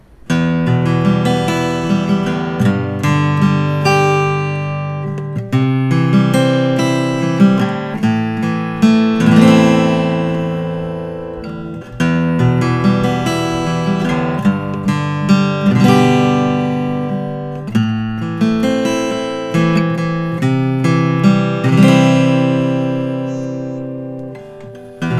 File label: martin custom 00 12 fret alpine spruce, Guitars Acoustic; ziricote; 2024; Martin Custom Shop 00 12-Fret